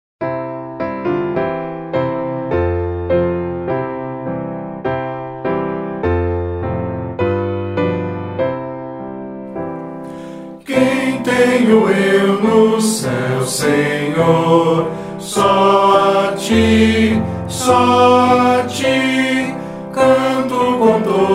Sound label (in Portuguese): voz piano